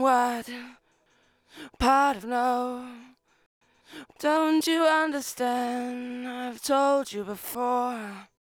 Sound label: Lead Vocal Verse II; Multitrack